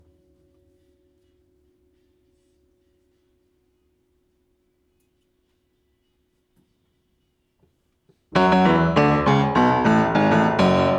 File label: Piano; keys